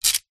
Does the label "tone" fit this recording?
No